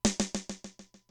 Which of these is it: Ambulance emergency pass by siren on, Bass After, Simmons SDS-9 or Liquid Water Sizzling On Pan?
Simmons SDS-9